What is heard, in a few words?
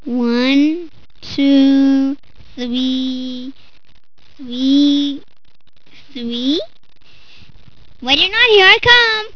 KIDS; KRONDOR